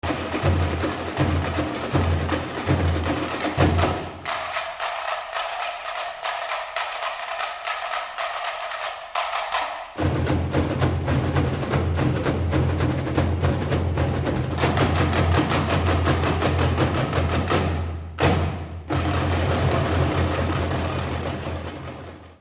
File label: mangue; rio2001